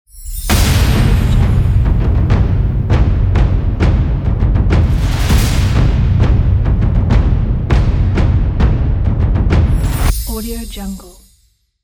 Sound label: epic adventure ident